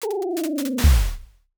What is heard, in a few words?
horror lantern flicker out